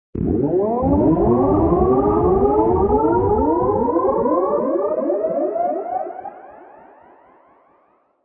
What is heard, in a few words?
Sound effect with long high pitch surge and space ship blasts of; Space Ship Take Off